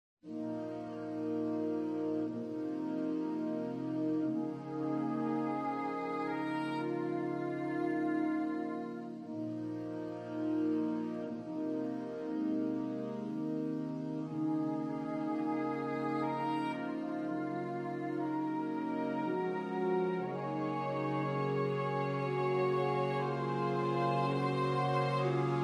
Morning Dew - Fanfare

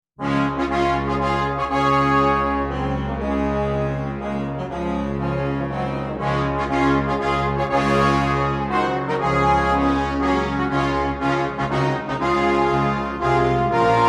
Thanks Be To God - Fanfare

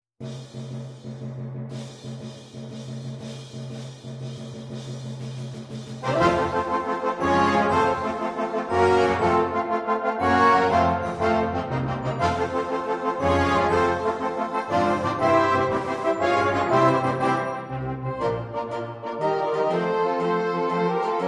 Andaluzia - Fanfare

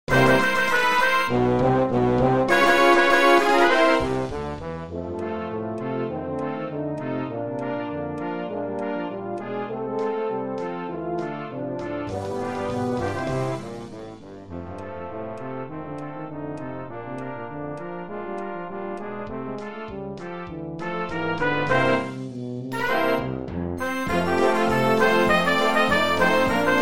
Listen to the Tuba! - Fanfare

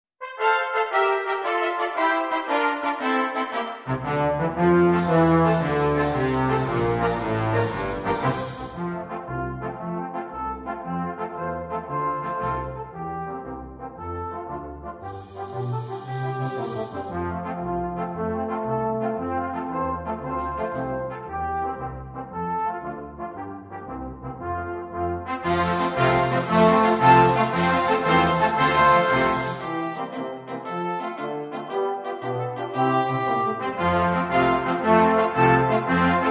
Alphorn Antics - Brassband

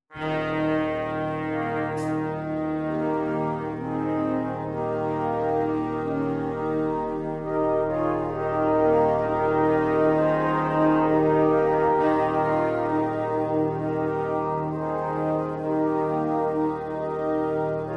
Kynance Cove - Fanfare

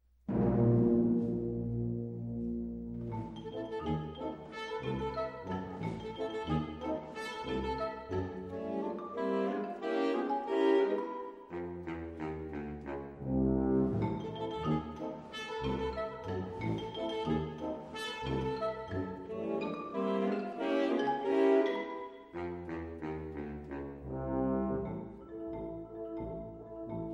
Sword Dance, Fanfare Band

